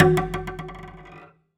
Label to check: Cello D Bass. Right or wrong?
Right